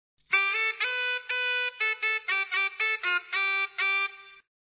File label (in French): chalemie